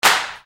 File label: Percussion, EuroDance